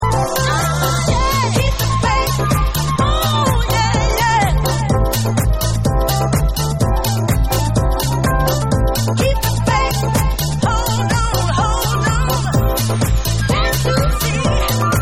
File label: Funky Rhodes Mix